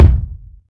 warm sounding kick g key, Royality free kick drum sample tuned to the G note